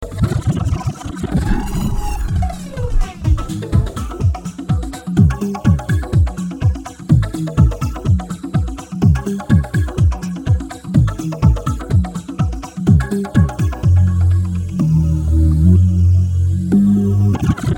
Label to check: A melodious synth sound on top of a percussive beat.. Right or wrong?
Right